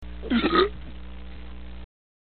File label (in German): ruelpsen